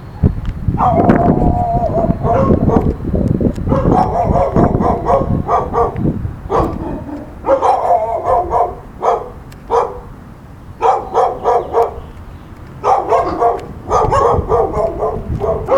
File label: pit bull, barking dog